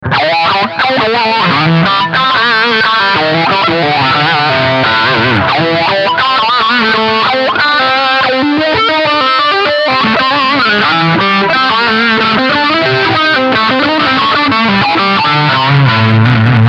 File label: LPF Overdrive